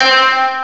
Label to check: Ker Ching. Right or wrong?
Wrong